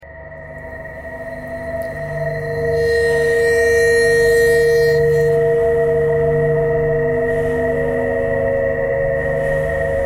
ice bowed vibes traffic k core birds thread pentatonic; bowedmetal